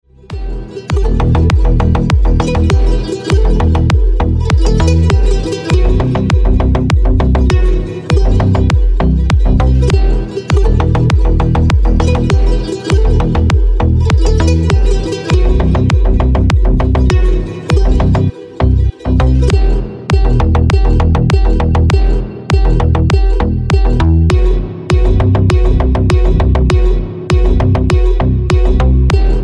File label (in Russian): Арабский бас